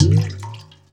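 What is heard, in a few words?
WATER BUCKET, percussive; Rarefaction; Poke in The Ear With A Sharp Stick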